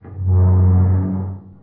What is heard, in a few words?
rocket groan